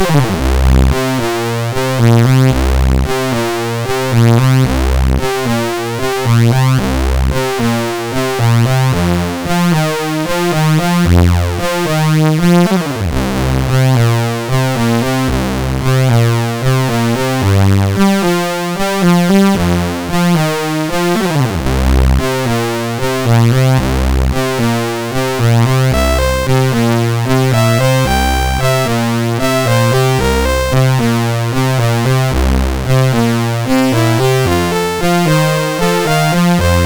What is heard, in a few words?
Funk Rock, MOS6581R2